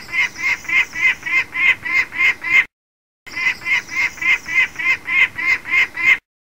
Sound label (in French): Pelerin alarme